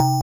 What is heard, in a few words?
BELL